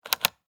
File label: Steampunk Mechanical, Scifi, Steampunk, Mechanical, Push, Button; Science, Fiction; BlastWave